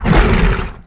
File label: Monster Golem type Attack; Inotia 3